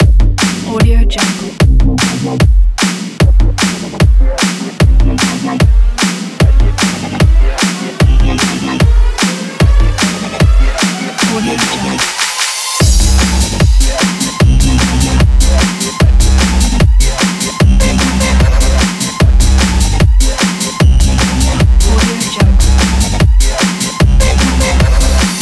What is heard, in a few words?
dubstep sport energy